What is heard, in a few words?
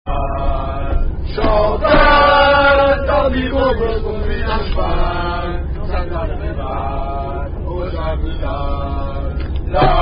Bawdy French Rugby Song; Chanson Paillarde; Last Night I Stayed Up Late To Masturbate